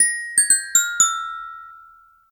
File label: Gliding Puffin